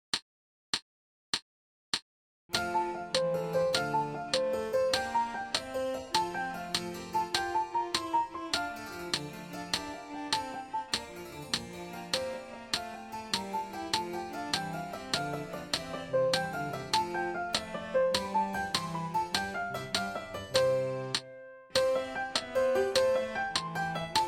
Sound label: giga, no repeats medium tempo with solo